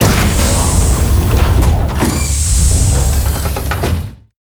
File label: Gear Locks Disengage